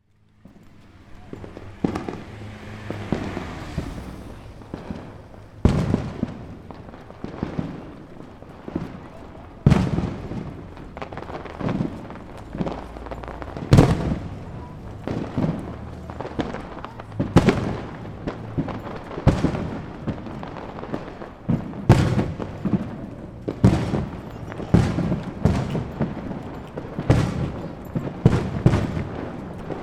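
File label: fireworks, distant; Chino Cultural Complex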